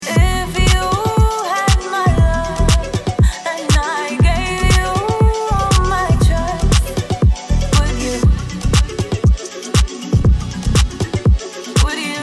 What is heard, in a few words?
SINGING